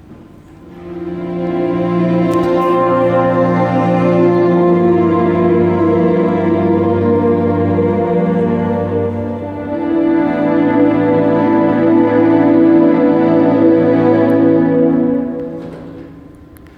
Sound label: piano